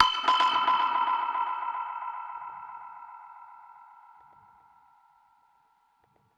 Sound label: Perc Hit, dub percussion one shots; musicradar